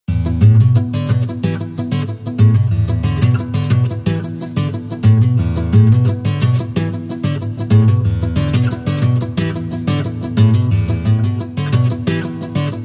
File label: Street Pulse